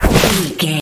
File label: nature spell bush tree whip